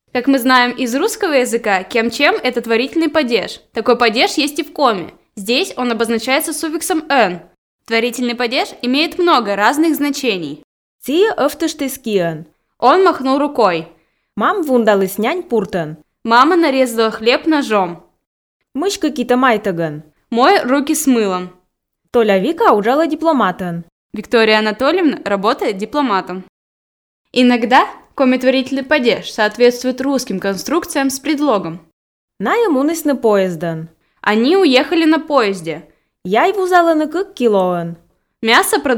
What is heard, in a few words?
instrumental